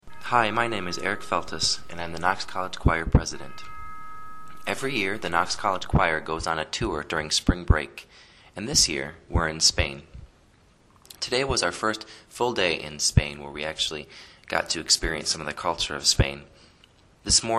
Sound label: Choir; Knox College Choir Spain Tour